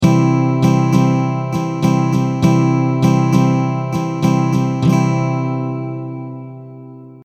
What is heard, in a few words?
stroke b, guitar